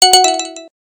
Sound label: Beep; lowbattery